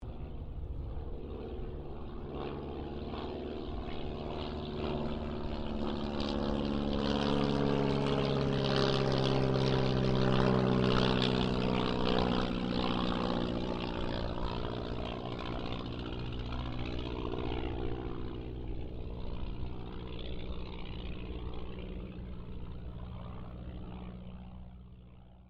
AIRCRAFT AUSTER III PASS; Airplanes; Vehicles; Airplane Sound Effects

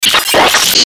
Glitch Fast Hiss Warble Blurp; glitches; Blastwave

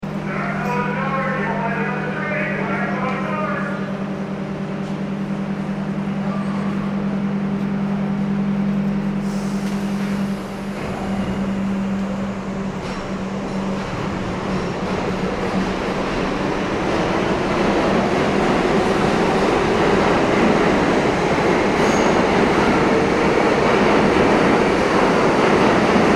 ambience train station subway trains people; New York City; Subways; Vehicles; McKinney Sound

